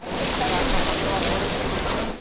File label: granary